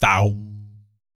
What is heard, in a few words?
BS, Vocal BASS FX